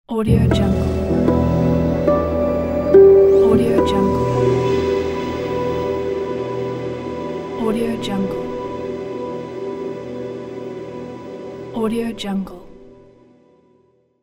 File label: harp opening logo